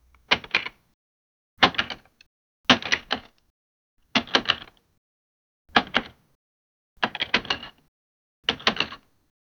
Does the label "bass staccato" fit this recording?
No